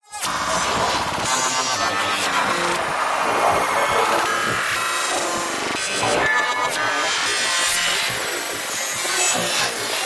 science fiction radio interference tuning weird voices musical bursts